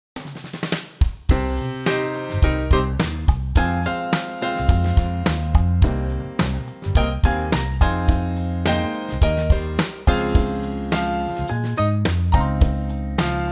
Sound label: Cm Trio; key - Cm - vocal range - Ab to C (optional Eb) - big big range!